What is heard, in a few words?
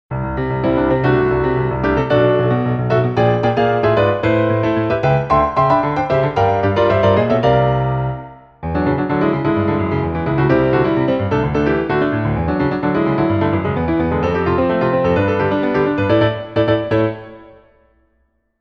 piano; VS Excite Bike